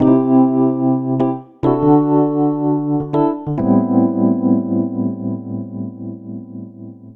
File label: Electric Piano; Dr. Fink's Funk Factory